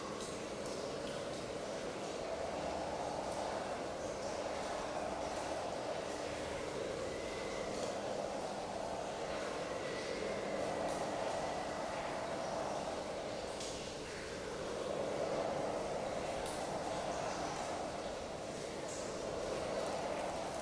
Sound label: teardrop ice well, Ambience; sf2e; murder in metal city